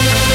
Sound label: Synth Shot; Vengeance Samples